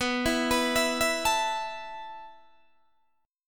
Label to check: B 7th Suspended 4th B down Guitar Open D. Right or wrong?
Right